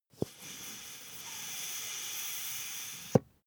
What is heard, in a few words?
air pump ball slow